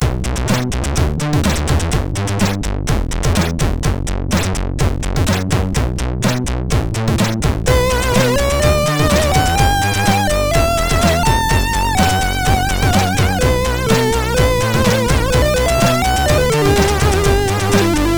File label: sid MOS6581; MOS6581R4